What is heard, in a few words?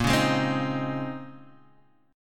Bb Minor 11th Bb down Guitar Half Step Down